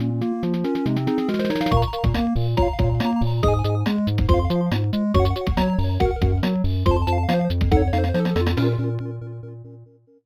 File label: Outlandish Outer Spacey Zone intro